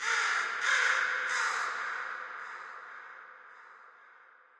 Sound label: amb crow; source engine; portal2; birds